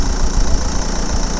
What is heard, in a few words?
opel corsa, Vehicles